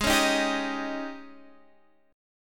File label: Ab Major 7th Suspended 4th, Guitar, Standard; strummed; x,11,11,8,8,11; AbM7sus4; JGuitar